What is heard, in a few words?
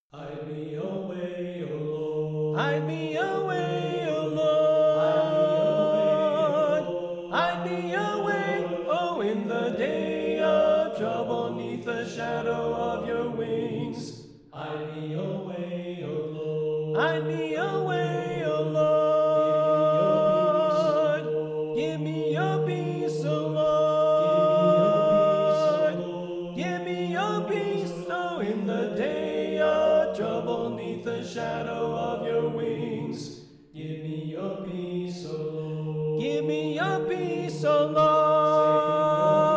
Alto Left